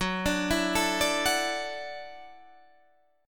E 7th Suspended 2nd Suspended 4th, Gb, chord

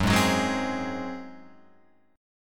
F sharp Suspended 2nd Flat 5th, Guitar, Open F; strummed; JGuitar

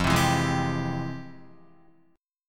F Minor Major 9th, Guitar, chord; FmM9 strummed

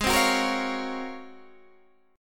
Ab 13th down Guitar DADGAD; Ab13 strummed

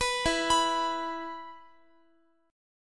E 5th E down Guitar; arpeggiated

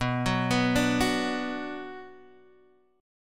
B Minor B Guitar Half Step Down

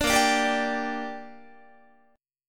A sharp 6th, guitar chord; A#6; strummed

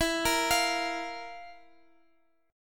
F Major 7th Suspended 4th E

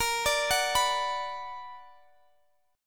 B Minor Bb; Bm/Bb; arpeggiated

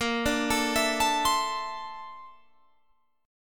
A sharp Major Sharp 11th, down, Guitar, Open G

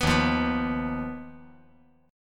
Eb Suspended 2nd Sharp 5th

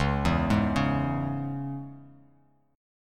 Db Minor Db; arpeggiated; JGuitar

